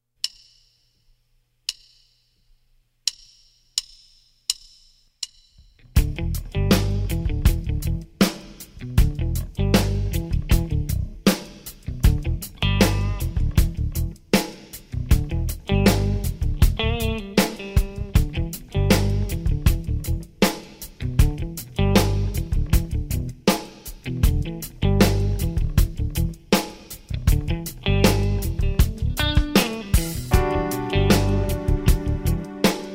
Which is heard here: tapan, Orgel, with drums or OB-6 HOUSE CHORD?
with drums